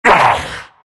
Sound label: big pain; Undying